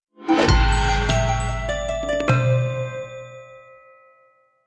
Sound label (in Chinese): 成就; 欢乐麻将